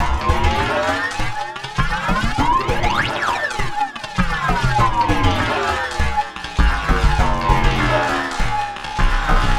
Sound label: Paper Loop; Sonic Foundry (Sony Creative Software); Esoterik Beatz